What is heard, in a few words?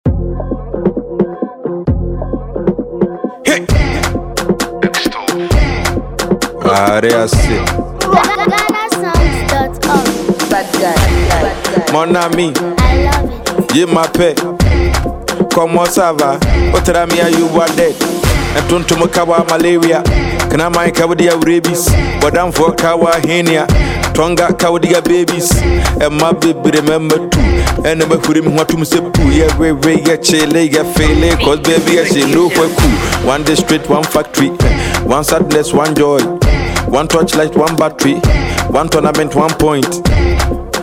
Freestyle